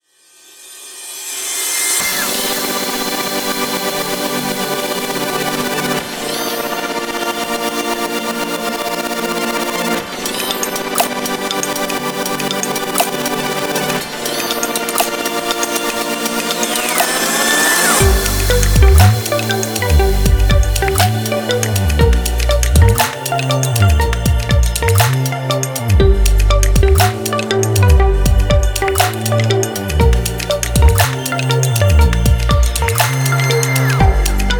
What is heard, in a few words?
Futuristic Creative